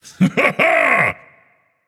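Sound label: non-verbal yay; smite; atlas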